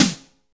shiny acoustic snare f sharp key, steel snare drum